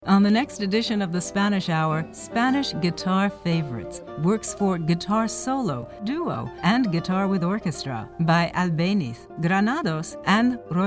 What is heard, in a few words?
Spanish Guitar; KVNO Omaha; KWAX Eugene